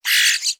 animal bird starling mother call in nest

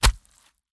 Just Gore Splatter Splat

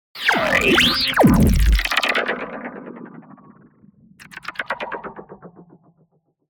Just Transitions SciFi; soundbits

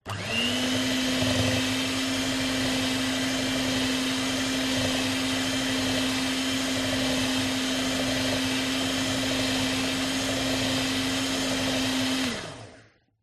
electric whisk mixing

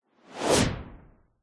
sound design whoosh fast swoop; zapsplat

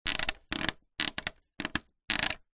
electric lawn mower plastic lever press no power

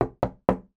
household door front glass window upvc knock x3; sound effects one

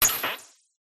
sparkly short glitch; PMSFX

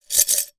broken glass pieces inside glass jar shake; zapsplat

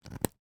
technology headphone plug in mobile phone socket; sound effects one

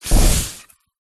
Fire Spell Ignite; sound spark

